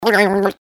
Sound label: funny babbling